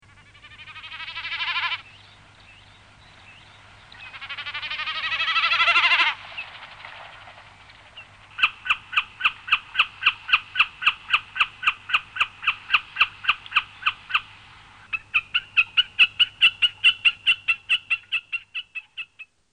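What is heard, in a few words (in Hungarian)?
Sárszalonka; Madarak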